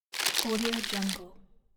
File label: paper bag rustling